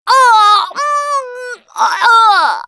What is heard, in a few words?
choke, russian, Survivor Voice Producer